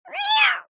animals cat screech frightened designed human voice; zapsplat